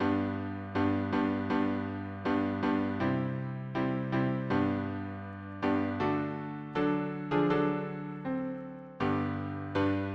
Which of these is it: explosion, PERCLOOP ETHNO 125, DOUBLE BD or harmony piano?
harmony piano